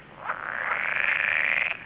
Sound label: lessonae